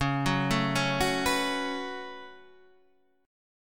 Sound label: C sharp 7th Suspended 4th Sharp 5th C sharp; down; chord shape